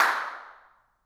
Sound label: incinerator dirac